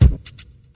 present kick one shot F sharp key, Royality free kick drum single hit tuned to the F# note; kick drum samples; one shots